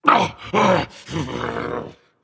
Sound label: Ouch, Dragon; fafnir